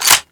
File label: bolt; elf ranger